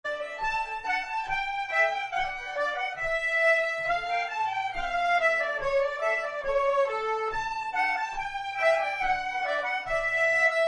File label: Schottisch from Ydre